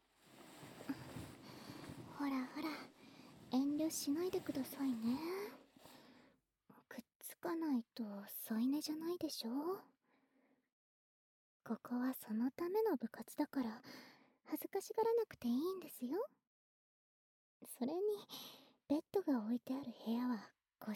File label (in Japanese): 悪戯 耳舐め マッサージ; ASMR